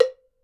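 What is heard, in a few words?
WOOD BLOCK; Miroslav Vitous - Percussion & Harp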